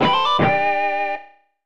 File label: Stage Completed; stone protectors; snes